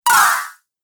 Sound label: Ice Shard Spell, Magic Sound Effects